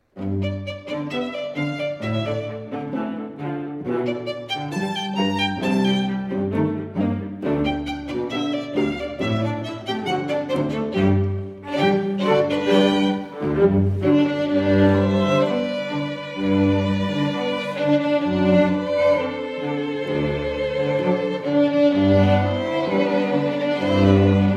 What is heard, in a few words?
string quartet